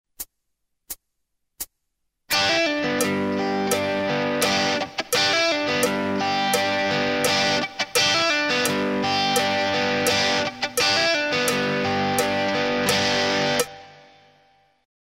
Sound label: fill in